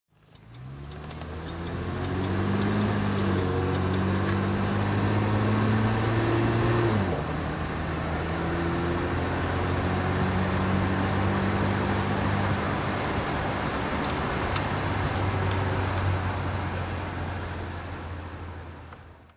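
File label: Jaguar mk2 drive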